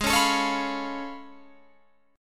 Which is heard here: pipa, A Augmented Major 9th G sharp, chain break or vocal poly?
A Augmented Major 9th G sharp